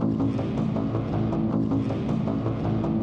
Cinematic Bass

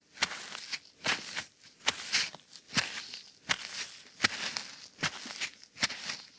Walk

